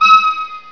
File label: HI SYNTH, electron; Easy Personal Web Page